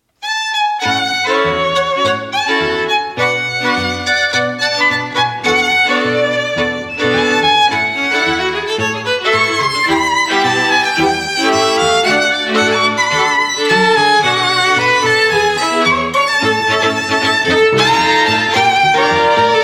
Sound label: strings